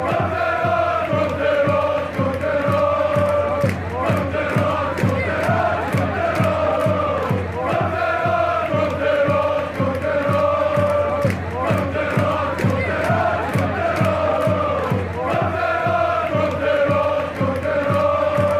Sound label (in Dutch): komt eruit, fanchants